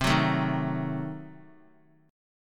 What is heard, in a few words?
C Diminished 7th down; strummed; JGuitar